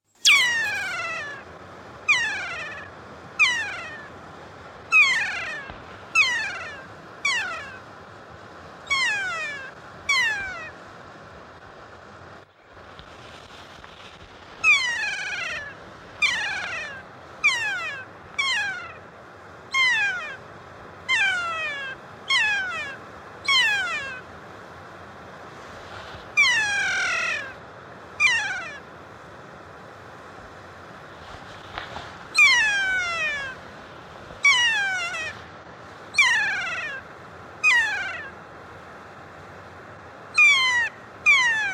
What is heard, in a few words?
kea call; The call of the kea is a long, loud, high-pitched cry.